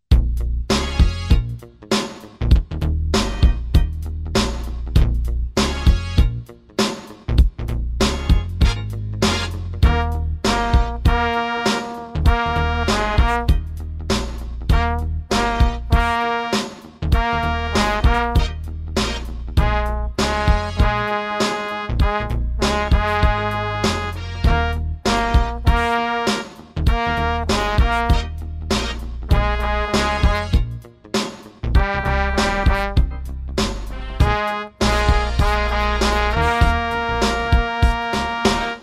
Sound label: NOTE SHUFFLE Tenor Horn